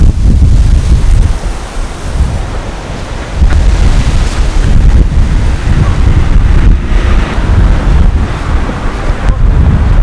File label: wind